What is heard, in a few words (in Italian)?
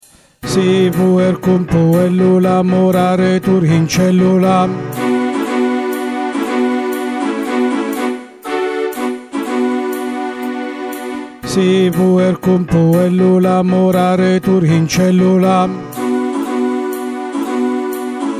baritoni; Carmina